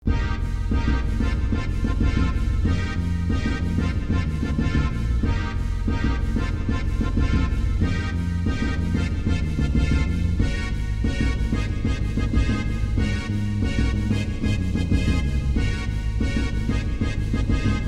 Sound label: Festival Drums; Ogre Battle